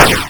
shotgun

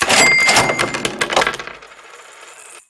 money

